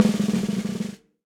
Snare Press Session Dry; Snare Articulation; Drum Hits; Session Drums Studio; Standard Hits

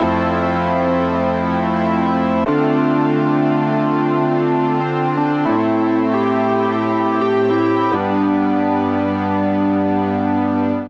Process Keys Chords C major 88 bpm; MIDI Clips; Accompaniment; Ableton; Factory Packs; Chop and Swing

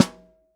SN Top, Snare

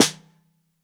SN Bottom, Snare

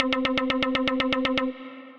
Melody Generator, Synth Rhythmic; Ableton; Factory Packs; Build and Drop

